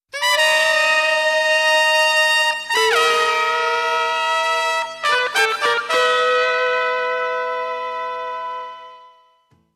Fanfare; Ren & Stimpy - Production Music vol. 2

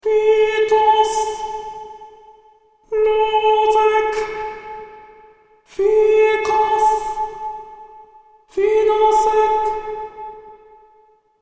Alto Chant; Soundtrack; Final Fantasy 8

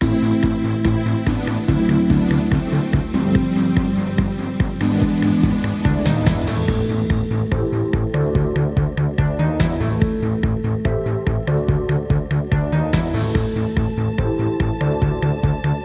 Coin Launcher; Chiptune; Wii; Super Smash Bros Brawl

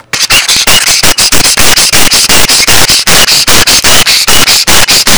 ouch

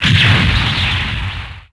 ROCK

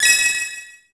ROCK

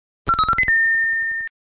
level start; Super Mario Land 2 - 6 Golden Coins

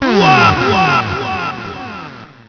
die; MMX4SFX

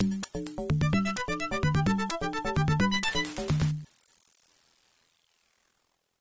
Level Clear; Streets of Rage; Genesis

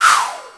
ROCK

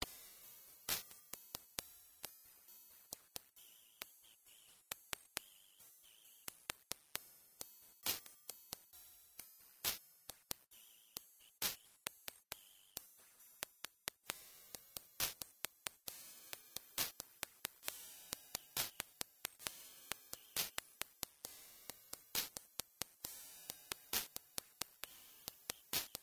Non-Tech Level Music; Genesis

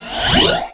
ROCK

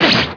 enemy hit; MMX4SFX

